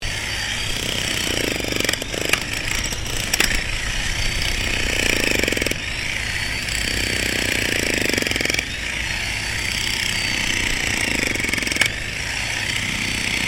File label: JACKHAMMER BOSCH ELECTRIC DIGGING SOIL STEREO, Construction Bosch jackhammer